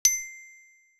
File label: message